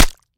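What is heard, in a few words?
quiet revolver shot at night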